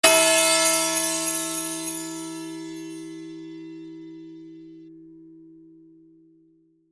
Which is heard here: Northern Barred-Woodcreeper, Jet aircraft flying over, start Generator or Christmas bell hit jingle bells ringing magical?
Christmas bell hit jingle bells ringing magical